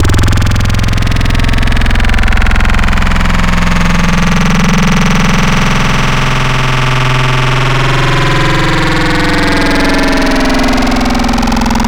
Power Surge, Wavetables; Cymatics; Wavetables Collection Vol 3; Serum

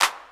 Clap Hit; 102 Emin; Hip Hop Exotica; Apple Loops; Big Fish Audio

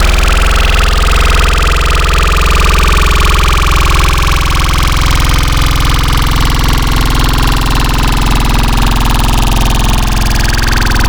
Halfway Vocal, Growl Wavetables; Cymatics; Virus Wavetables; Serum

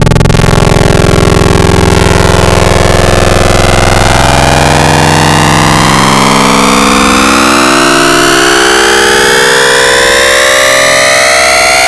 FM Table, FM Wavetables; Cymatics; SERUM; FM Table 5

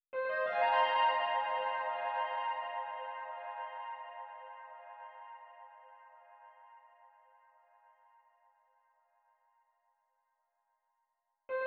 169 BPM F Min Synth Run; Cymatics; Twilight; Destiny Gold Expansion

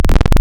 Shifting Pulse, Wavetables; Cymatics; SERUM; Anniversary Wavetables

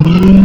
Burp; Cymatics; Vomit Wavetables; Serum

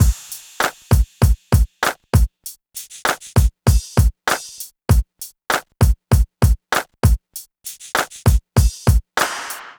drum loop 098, Hip Hop India, 098bpm

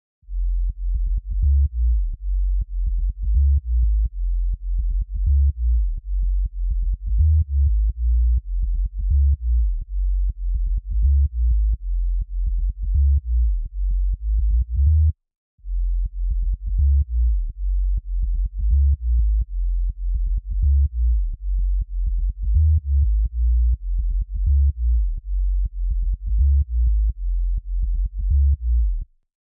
Sub Bass, Future House 125 BPM A#; Big EDM; Future House Tools; Construction Kits; Pump

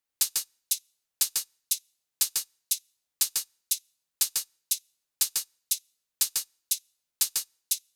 Big EDM Drum Loop Hat loop, 120 BPM; Future House; Future House Tools; Big EDM Drum Loops

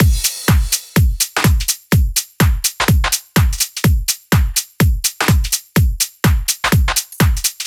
Big EDM Drum Loop Mixdown loop, 125 BPM; Future House; Future House Tools

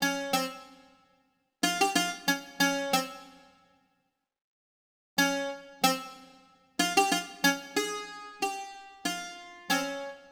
Bouzouki

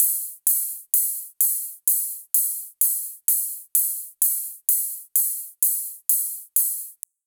Big EDM Drum Loop Ride loop, 128 BPM; Future House; Future House Tools

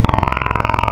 Pig, Animals Wavetables

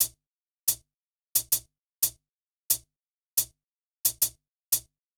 Hats, Drum Tracks, Amin; Hip Hop; big fish audio; Hip Hop Exotica; Apple Loops

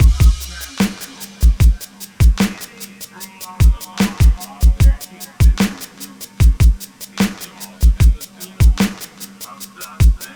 Drums; Hip Hop Philosophy; big fish audio; Apple Loops

